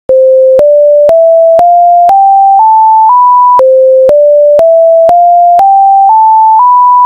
single freq

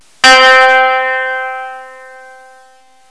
Koto, sound instruments; sound analysis

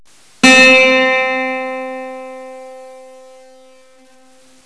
Steel Guitar; sound analysis; sound instruments

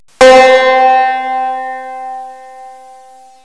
Banjo; sound analysis; sound instruments

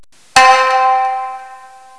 Shamisen, sound instruments; sound analysis

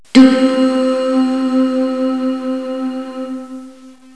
Oohs; sound analysis; sound instruments

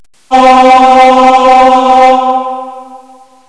Aahs; sound analysis; sound instruments

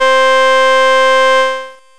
church; envelope

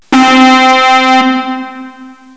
Square; sound analysis; sound instruments

